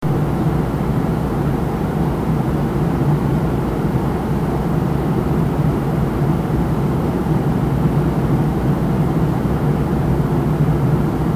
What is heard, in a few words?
Plane